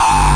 obnoxious kick; Kicks

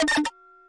virus crunk n squeak, Virus Electro Hits; UNUSUAL KITS and FX

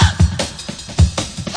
152 vocal burst weak break; 141 to 160 bpm

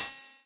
Syn Dr, Overloaded Synthetic Drums; style kits

